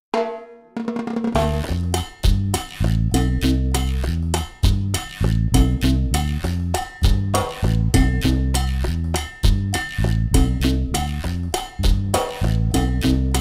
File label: Chachacha